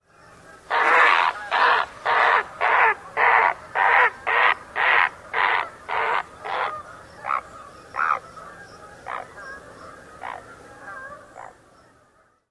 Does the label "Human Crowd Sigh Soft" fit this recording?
No